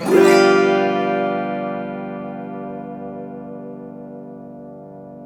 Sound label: AUTO HARP, Auto Harp Min 7